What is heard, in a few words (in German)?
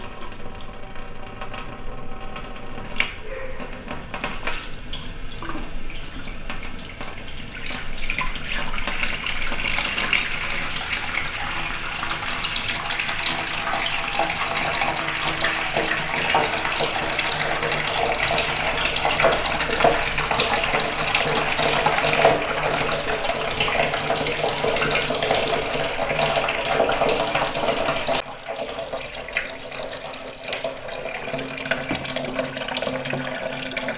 Wasserhahn, Cafe in Lissabon; Fieldrecordings